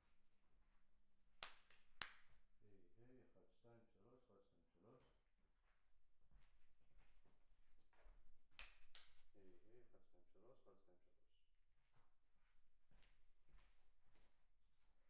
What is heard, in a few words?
PERC OH